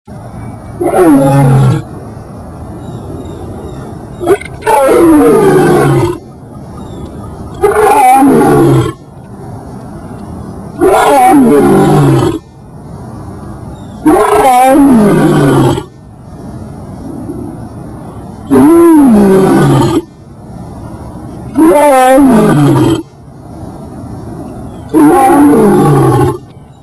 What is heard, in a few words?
LION ROAR EXTREME CLOSE UP